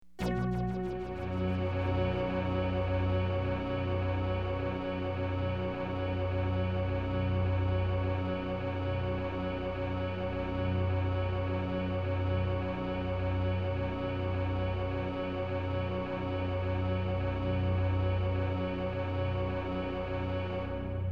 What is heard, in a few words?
drone